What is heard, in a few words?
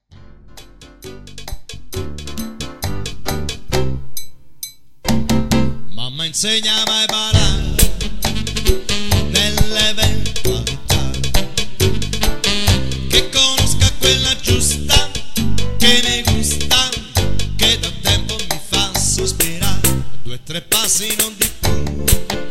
CHA CHA